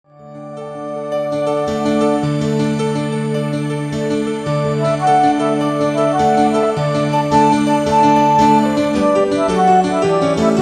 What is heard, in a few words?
Instrumental Here We Come A Caroling; Christmas